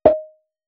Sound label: Hats, Alesis Performance-Pad